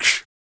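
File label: vox percussion, Vocal Planet Jazz FX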